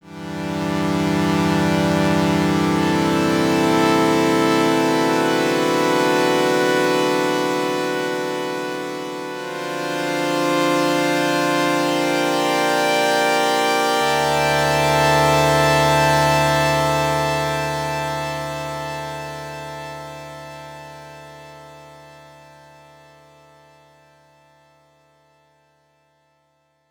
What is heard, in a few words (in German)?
synthesizer strings suboszillator